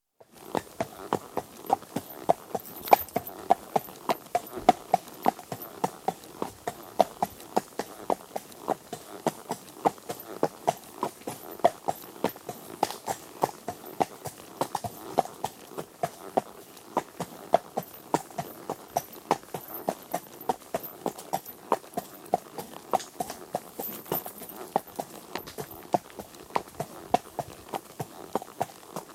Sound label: One horse at walk on tarmac, recorded from rider's perspective, lots of creaking from saddle, horse stops & fidgets at end of track, with wind noise; some fabric noise from rider; BBC